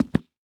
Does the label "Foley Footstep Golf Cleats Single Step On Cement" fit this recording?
Yes